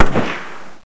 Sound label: bang